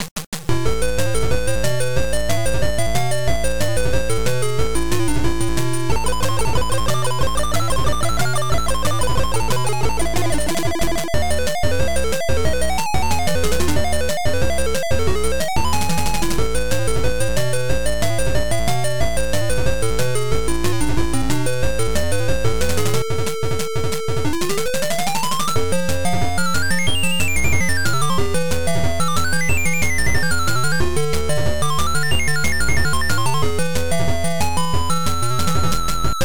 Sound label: toccata and fugue in d minor; gyruss